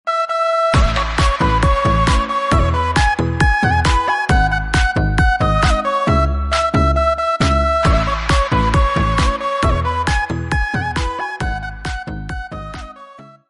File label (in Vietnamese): nhạc PK Win, PK chiến thắng; hiệu ứng âm thanh livestream